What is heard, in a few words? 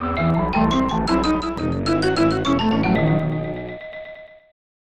Eerie Fanfare; Porky Pig's Haunted Holiday; SNES